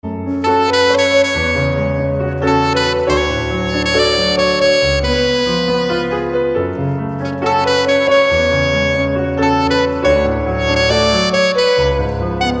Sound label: saxophone piano cover